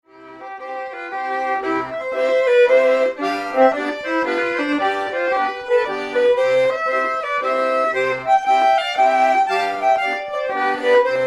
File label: Mazurka